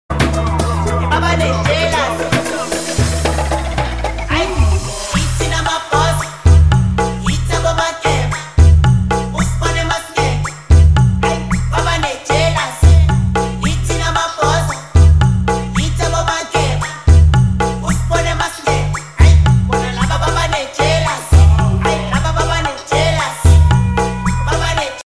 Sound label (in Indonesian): DJ Sedih Remix; viral tiktok